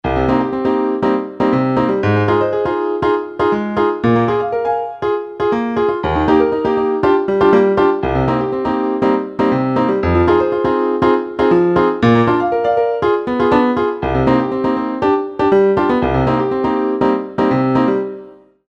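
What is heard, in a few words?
Piano Pop Arpeggio 4/4; mfx chain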